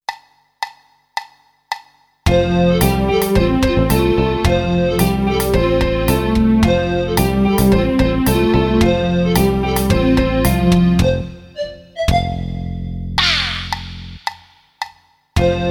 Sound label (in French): VERSION KARAOKE; Alex et Zoé 1